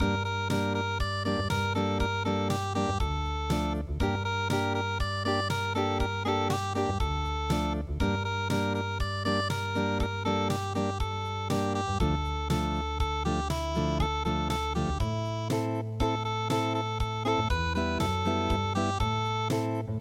unpluged, midi; bousan

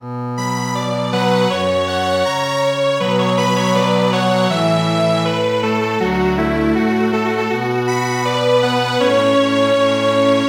Strings Quartet; Autumn Mountain Song

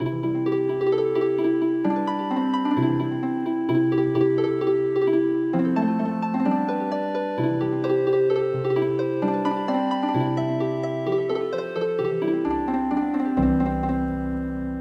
Healing Harp, traditional; The Colorado Trail

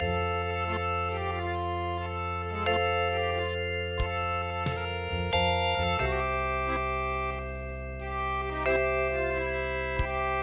Paris Musette

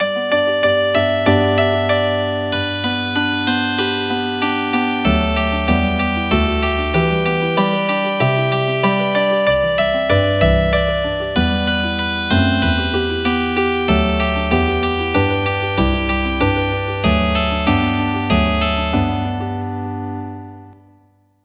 Healin Harp; traditional; Long I gaze across the snow

